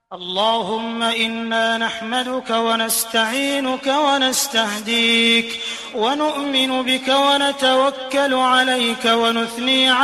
beautiful voice tilawat e quran ringtone

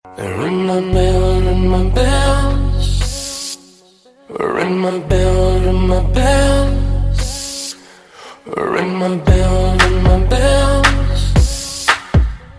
ringtone

